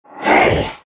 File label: shards pain; terrorblade